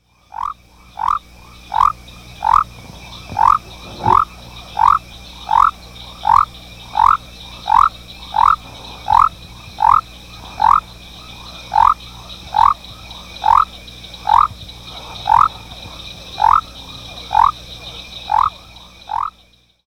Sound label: Leptodactylus mystaceus